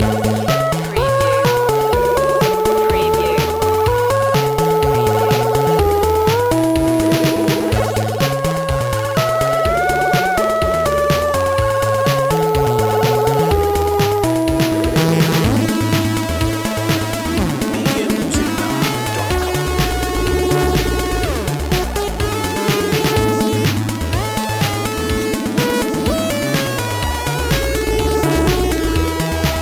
retro video game hotseat loop

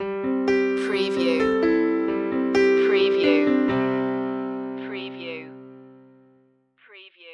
piano tuesday stinger

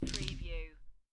Dice Throw Five Dice On Felt

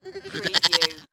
Goat Bah; sound-effects-bible

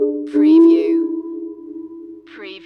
Hip Hop Synth Licks 90 BPM - E

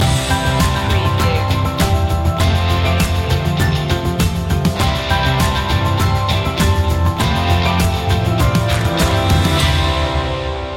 no vocals

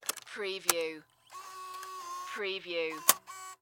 DV Camera Eject Tape

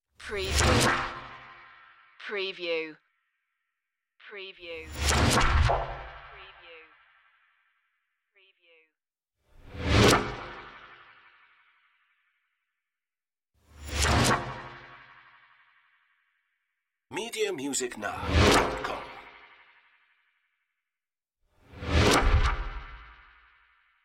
transformation

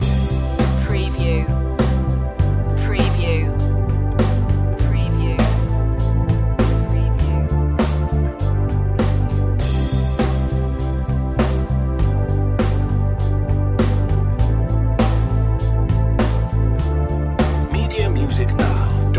step by step loop

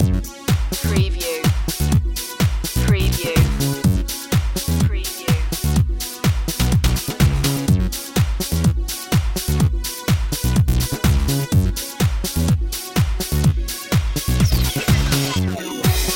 digital stream loop

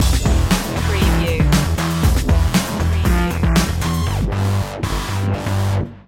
thunder stinger

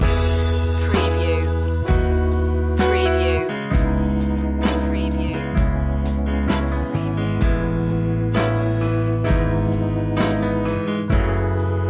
southern church loop

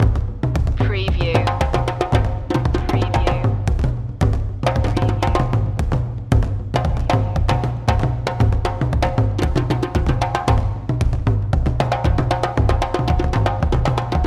african drums loop

